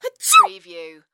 Sneeze Female; sound effects bible

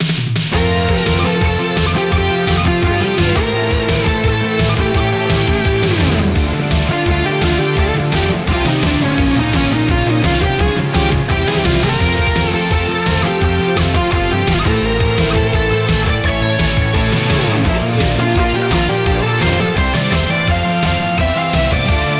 j-pop victory

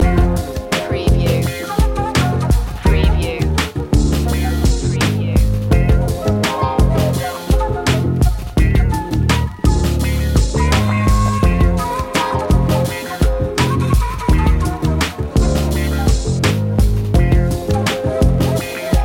up in smoke loop

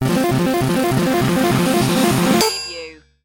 Casino Slot Machine Winning Count

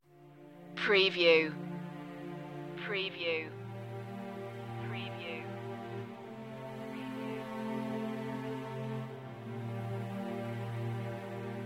intro part strings and choir

